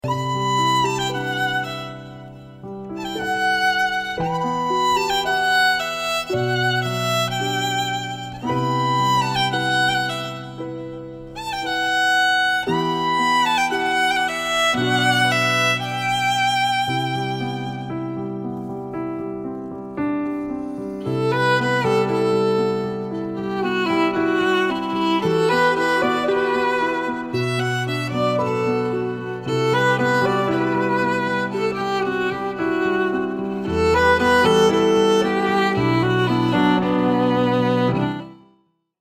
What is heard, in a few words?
violin cover